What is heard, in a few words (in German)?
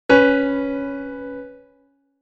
Intervalle simultan